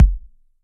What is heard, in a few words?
Kick Drum Case; One Shots; Drums; Yakiman